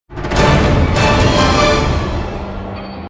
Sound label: battle win music